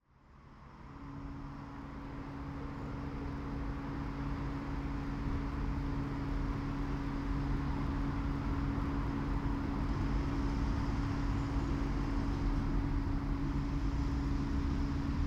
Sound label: stream Crow Ambulance; maanweg; de binckhorst